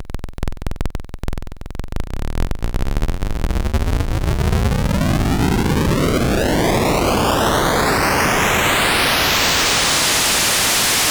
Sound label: noise sweep